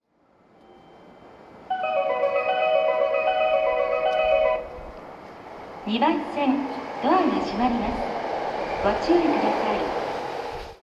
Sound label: higashifunabashi 2 melody; jreast; sobu